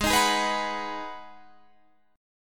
G sharp Minor 7th, Guitar Half Step Down

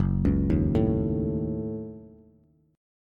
Gb-Minor 6th, Bass; arpeggiated; JGuitar

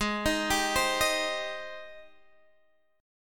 G sharp Major 7th, Guitar, Standard; arpeggiated; chord; x,11,13,12,13,11

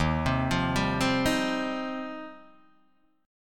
Eb 6th Add 9th, Guitar, Open D

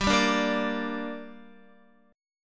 G Minor G down Guitar, chord; strummed; JGuitar

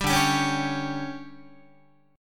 D Major 7th Suspended 4th, Guitar

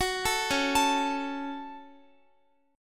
Db Suspended 4th Sharp 5th Db, Guitar; arpeggiated; x,x,16,14,13,16

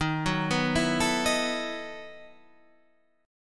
B 11th Eb, chord

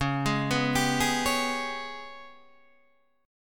Ab Minor Major 7th Double Flat 5th

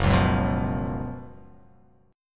F sharp Major 7th Suspended 4th

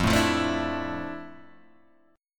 F Major 13th, chord; strummed

